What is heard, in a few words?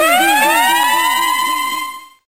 air raid